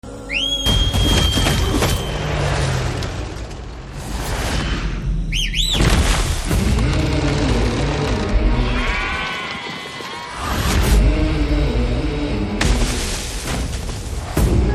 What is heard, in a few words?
Whistle BGM Telugu Ringtone; Pushpa Raj